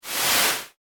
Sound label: White Noise Whoosh; Whoosh Sound Effects; accent sfx for whoosh, wherever swish, sweep and swoop sounds are required; Andrew Fleming